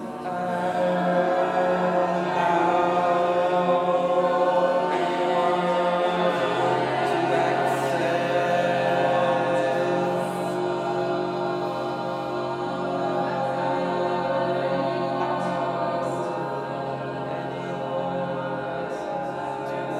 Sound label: choir recording